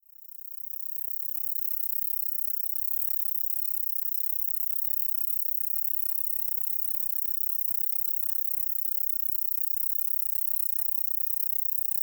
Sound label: Pacarina puella, cicadas songs; USA; filtered